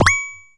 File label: ui mouse click